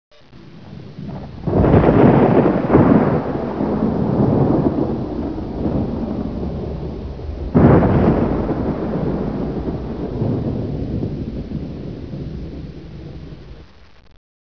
thundaa

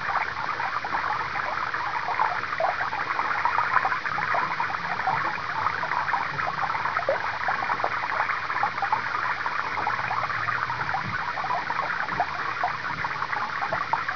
fountain; de pirates

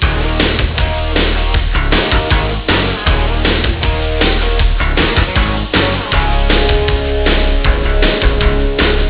song

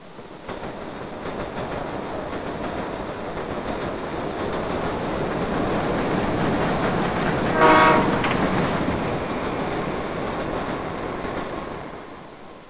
bronx sub

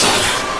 pump clack; hydrophobia

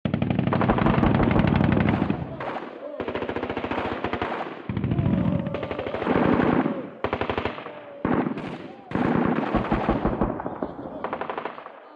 bgm; de dust2b

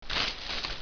bush walk

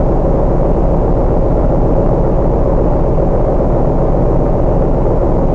vehicle; de color world

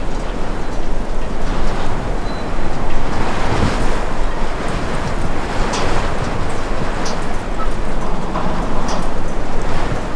wind metal bangs interieur; de frosty

